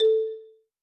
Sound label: Tone Button; UI